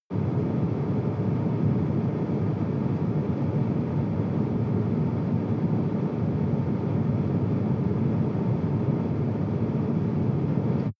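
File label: sports car interior ventilation step 9-15; verkehr transport; autos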